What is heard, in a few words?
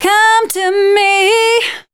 voice spectral, ly short